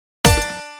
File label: stop bonus; Amatic; Book of Pharao